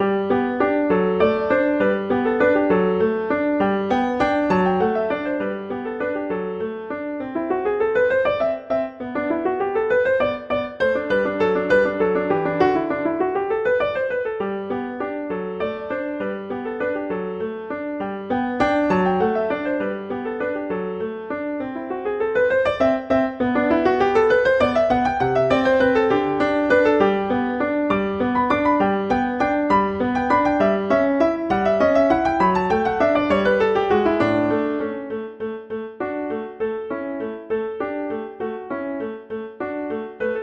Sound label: Sonatina, Allegro; Clementi; Sonatinas